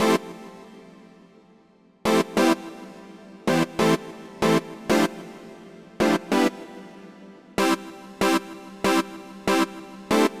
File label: Poly Stacks